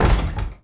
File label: metal door locked